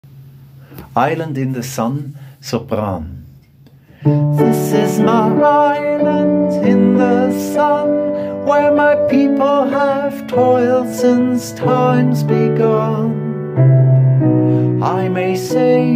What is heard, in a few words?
Sopran